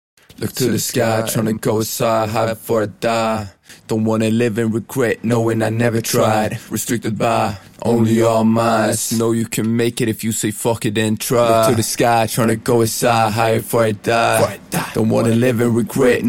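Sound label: hip hop 85 Am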